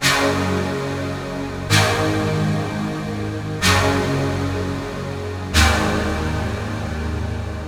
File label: Nord, Pads; Sonic Foundry (Sony Creative Software)